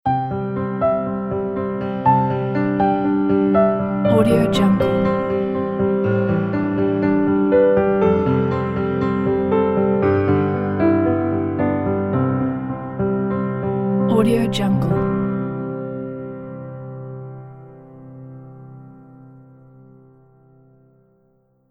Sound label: lyrical piano ident; logotunes